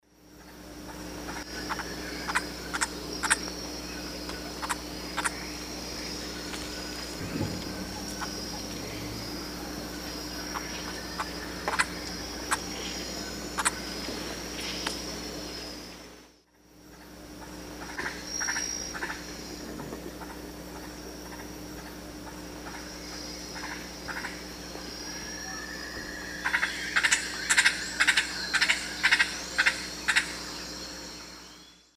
Pteroglossus inscriptus, calls 2 birds; S. America; Eastern Lowlands

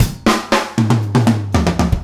ALT ROCK DRUMS

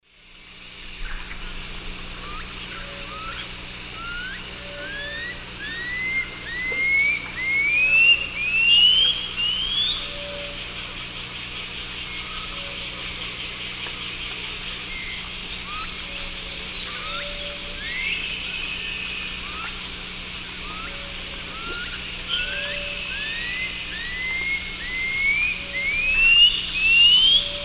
Pipile pipile, song; S. America; Eastern Lowlands

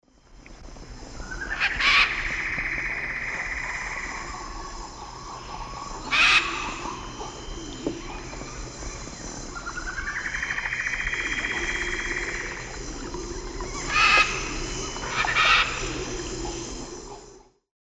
Ara severus, flight calls; S. America; Eastern Lowlands

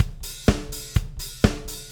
Drums, Rock

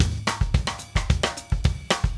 Drums, Jazz

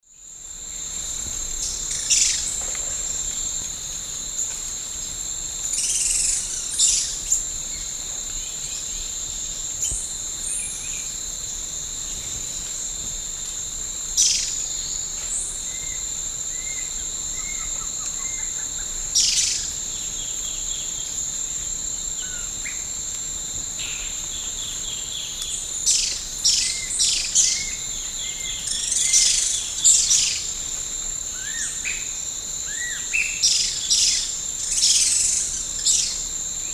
Xenops milleri, calls 2 birds; S. America; Eastern Lowlands

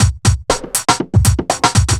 chemical 120, loops, 120bpm

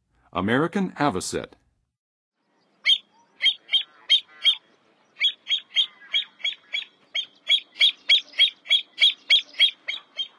American Avocet; North America; Western USA; Western USA Songbirds

